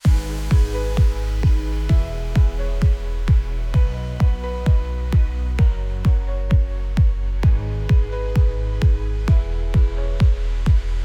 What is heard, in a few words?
EDM Version